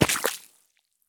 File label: sprint puddle; Escape from Tarkov